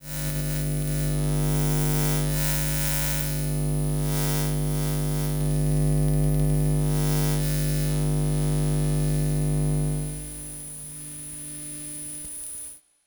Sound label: electro magnetic interference; 3maze